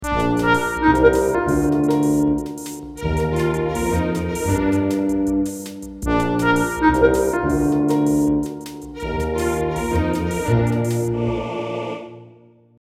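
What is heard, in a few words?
theme orchestra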